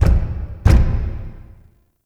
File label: Knock; Shadowgrapher; Warframe